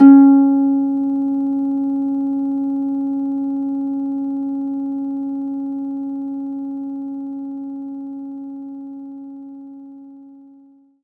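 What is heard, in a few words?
Orchestral Harp C sharp 4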